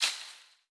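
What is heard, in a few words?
Disc Ricochet Tree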